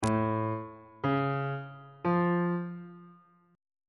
g flat melodic minor chord 3 is b double flat augmented on bass clef